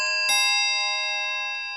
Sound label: on pre break; SafeEyes